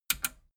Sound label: metal button radio click enable fast